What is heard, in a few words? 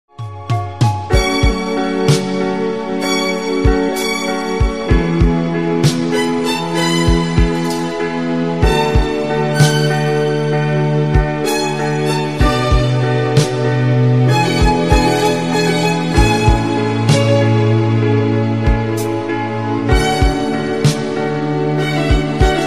Mobile ringtone